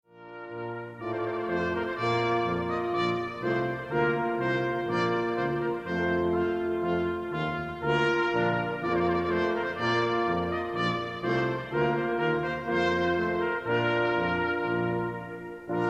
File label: trumpet voluntary